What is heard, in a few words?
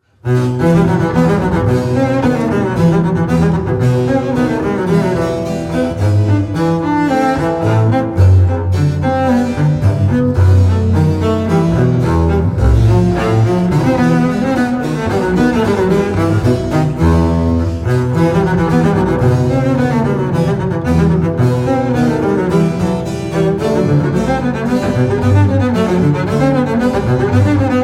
Sonata; A Vivaldi